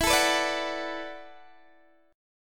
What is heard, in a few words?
E 9th Suspended 4th E down Guitar DADGAD